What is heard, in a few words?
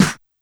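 D2 Analog Snare Drum Q, Yamaha PSR-E323 Dance Kit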